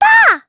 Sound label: Jump, jp; Lilia; kingsraid